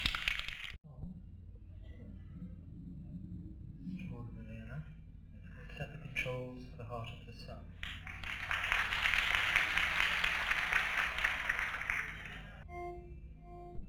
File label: Tuning; Lund, Sweden